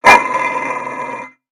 Pet Dog Robotic Angry; creatures pets; Lego

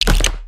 model drop; build mode

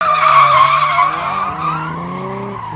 Skid Around Corner, vehicles; aronwk collection; ndaudio

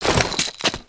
Dance; Skullken; creatures organic

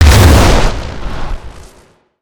Blaster Blast; Overcharged

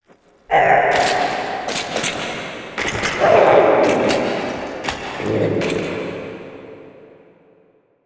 Cave Ambience; Skullken; ninjago

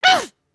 Angry, emotes

